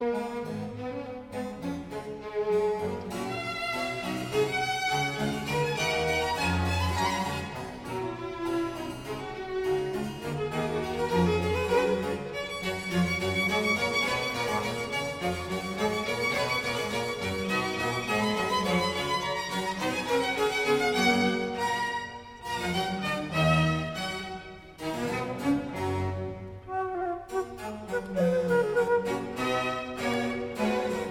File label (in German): concerto g dur wq 169 fuer traversfloete streicher und basso continuo presto